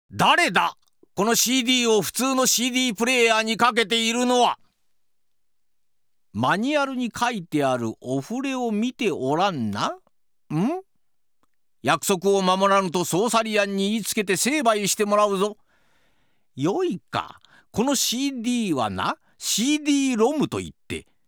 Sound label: Warning